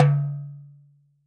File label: Metal Darbuka